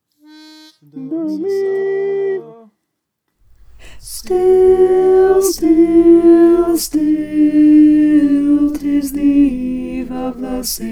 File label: alto